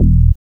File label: Dance Bass, Roland; Sound & Vision - Gigapack II CD 1